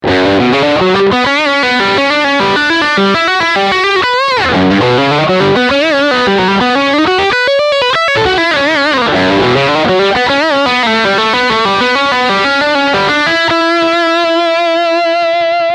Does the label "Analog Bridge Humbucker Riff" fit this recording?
Yes